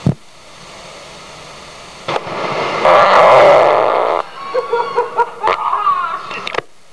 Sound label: bigandloud, fart